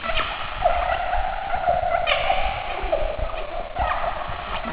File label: alien squit; valve; dangerbox